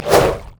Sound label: basic attacks; Ziggs; poolparty